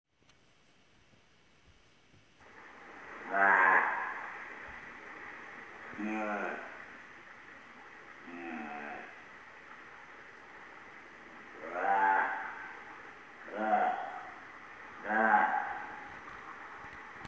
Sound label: hawaiian monk seal